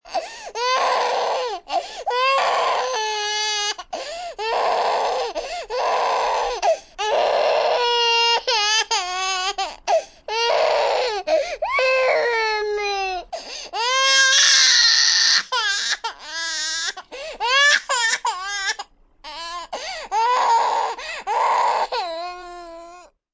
Cry Baby Wailing, Cry, baby long wail, pain, hurt, sad (human voice); Crying Sound Effects